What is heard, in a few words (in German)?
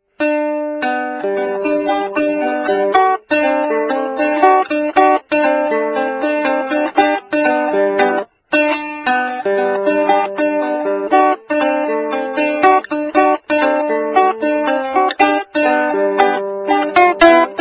Schnaderlhupferl; Bari; chester